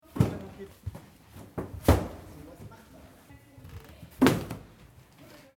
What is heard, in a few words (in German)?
Judo Matten stapeln